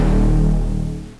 game over; Blox Arcade 1.0.2

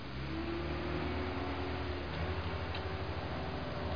TRUCK; Deadlock; PC Gamer 36

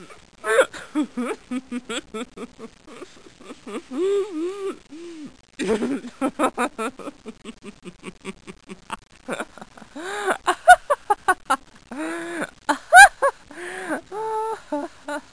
Woman Giggling; Soundsation; Human

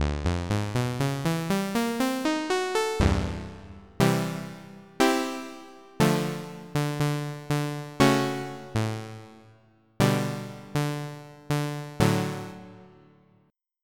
Peaky; Onj's Soundfont

